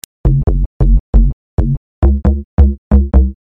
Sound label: Loop